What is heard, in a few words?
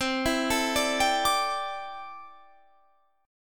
C 6th Add 9th C